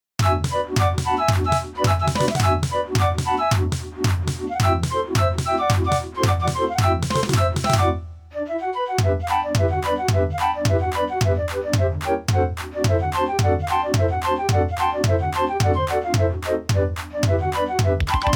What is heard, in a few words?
Choo choo samba, draaiorgels